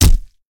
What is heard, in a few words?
body; Original TK Sounds